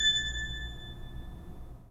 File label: 3D Organs Pipe Mix Celeste